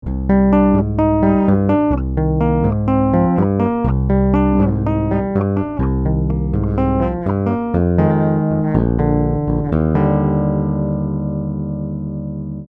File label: Harley Benton BZ 5000 2 Hand Tap passive